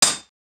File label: hammer, wood metal; wood metal SFX